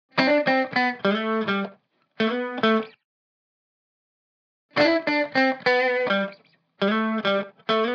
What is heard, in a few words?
104 BPM E Min Lead Guitar; Cymatics; Miami Guitar Pack - Pt 1; Autumn